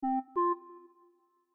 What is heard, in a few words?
knock